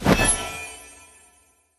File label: Attack Cast; Linirea Arcane Magus